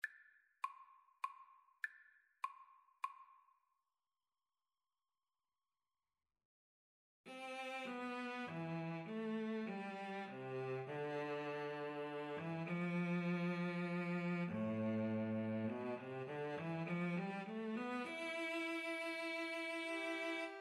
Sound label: Piano Trio